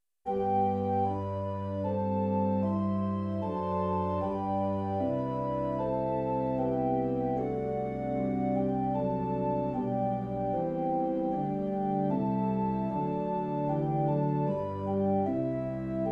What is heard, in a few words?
Virtual Choir SA; chorus ad hoc